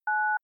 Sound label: DTMF 1